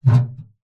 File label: foley metal box large lid open